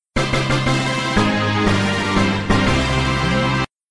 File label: follower victory music; dlive